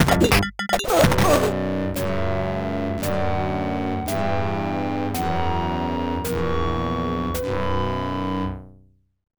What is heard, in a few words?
Lose; Slaughter Sport; Genesis